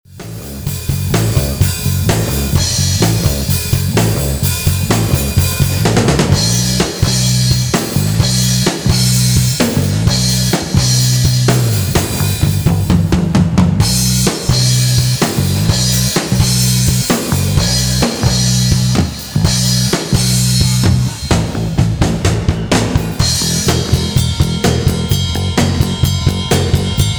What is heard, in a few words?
Drum Bass